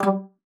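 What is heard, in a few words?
G3, bass flute; Xsample VOL-2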